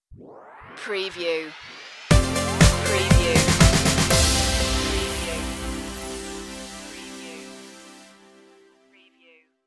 gliding waves stinger

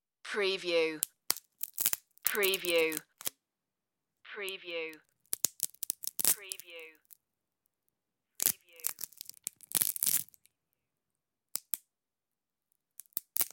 wood crack

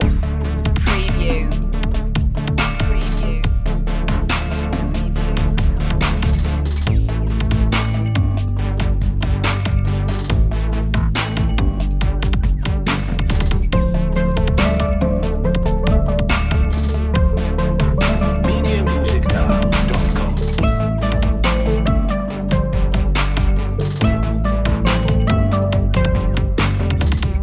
so hip loop

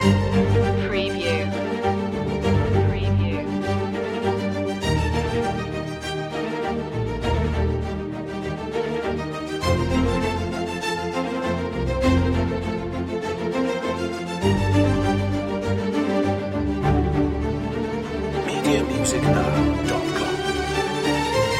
the storm is coming loop

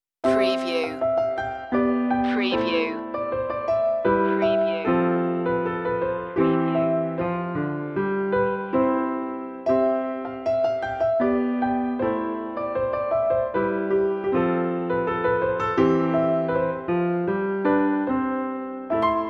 hornpipe piano

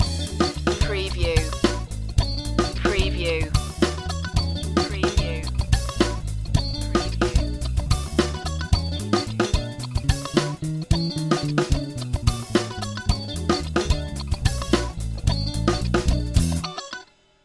cadillac loop

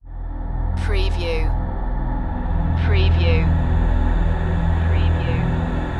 remix loop start

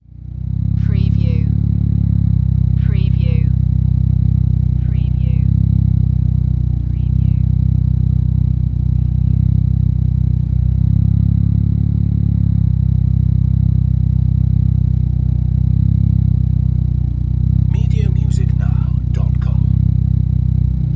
MITSUBISHI ECLIPSE EXHAUSE IDLE

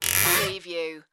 creaky wooden closet door

